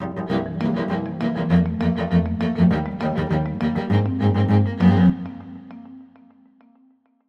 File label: foothills lowkey head 100bpm